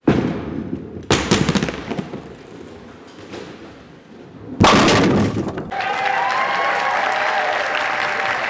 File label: Bowling Running; Interactive games; Grammar Games; Simple Present